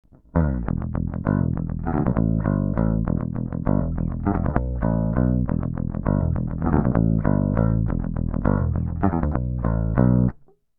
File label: Street Pick